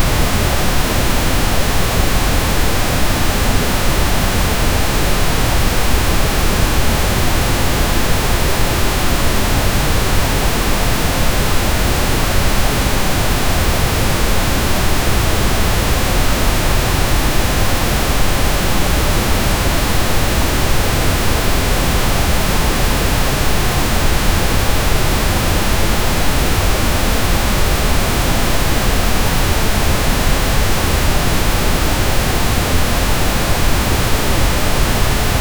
continuous pink noise

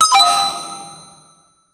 diamond sound bell tink

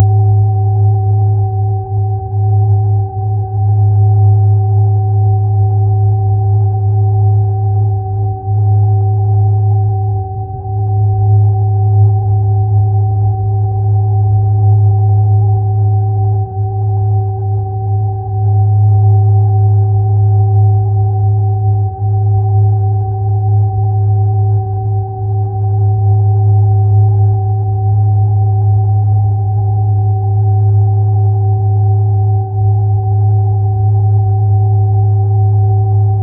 loop sound a cyborgs blen

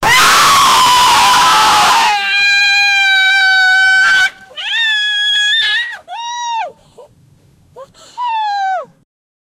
people screaming then a b, baby crying

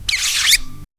racking the slide on a, pistol

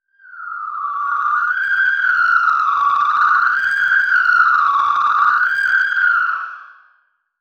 alien screech siren scream; alien communication; SFX Engine

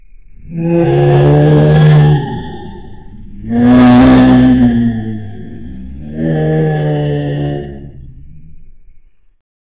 the sound of a strange, monster

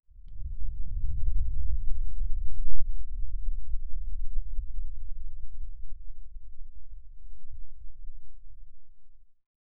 quiet hell ambiance soft, lava

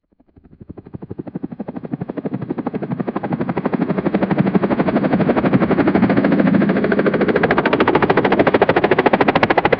helicopter

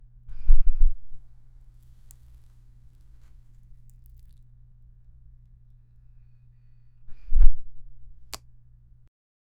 a flower that opens gentl

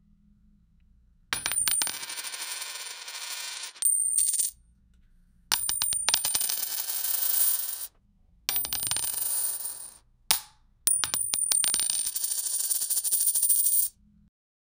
the sound of coins; finance

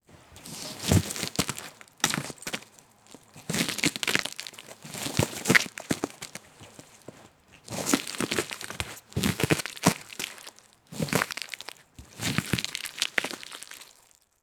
alert tone

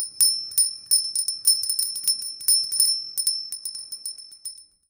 three sounds of delicate, bells

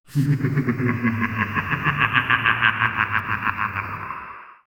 a monstrous layered demon

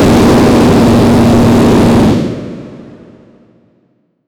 ak47

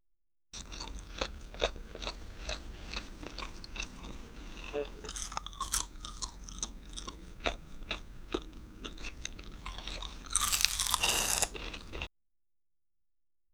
eating food with chopstic, dining

